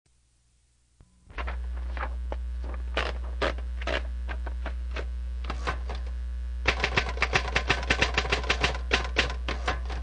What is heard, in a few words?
Typewriter